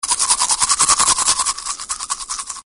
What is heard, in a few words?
Teeth brushing